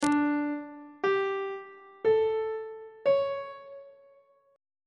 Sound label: c double sharp major 7th suspended 4th chord intervals on treble clef